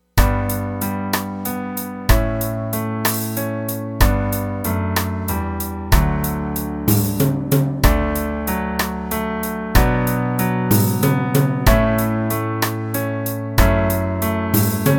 Dotted Half Waltz Harmony

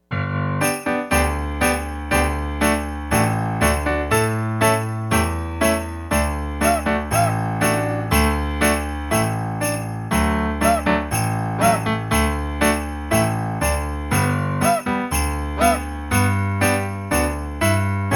Advanced Jingle Bells

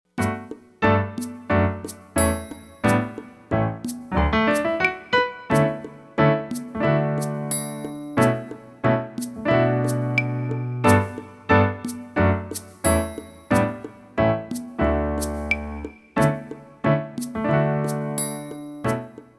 Strings

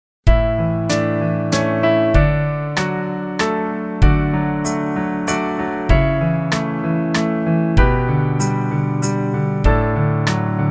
French Folk Song Melody

